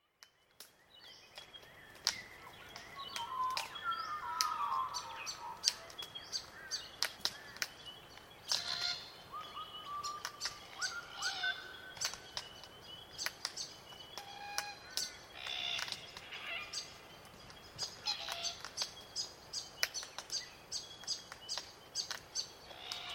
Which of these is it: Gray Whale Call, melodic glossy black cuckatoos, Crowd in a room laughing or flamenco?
melodic glossy black cuckatoos